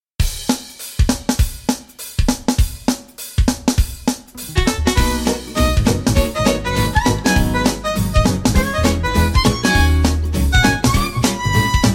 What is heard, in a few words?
bluegrass fiddle; Painted Hillside; red room audio; traveler series; kontakt